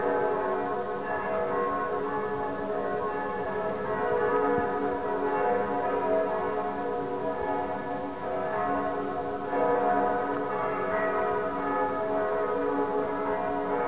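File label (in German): Kirchen, Eichstätt, Dom; Passau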